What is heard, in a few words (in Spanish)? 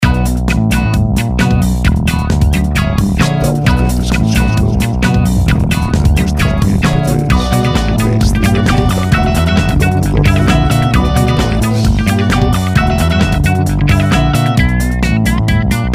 rock